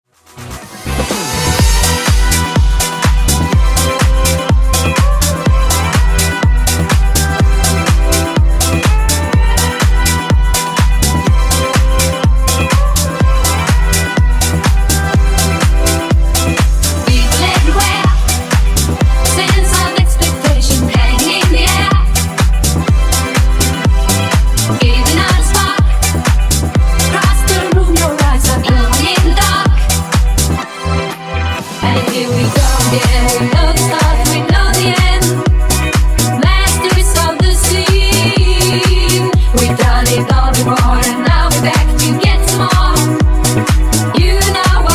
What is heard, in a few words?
Disko Funk 124Bpm